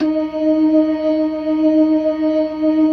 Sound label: LO MAN V R, ORGAN LOWER MANUAL; Keith Emerson Signature Series